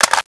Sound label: clip in, AK74; weapons